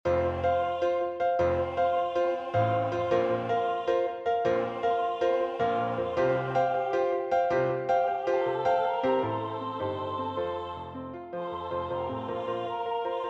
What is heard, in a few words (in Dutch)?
Sopraan